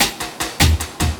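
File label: loop snare drum, 150 BPM, drum & bass; Ueberschall; Drum & Bass Resonance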